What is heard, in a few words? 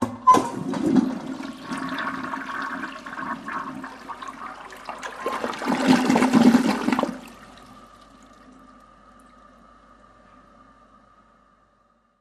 Home Bathroom, toilet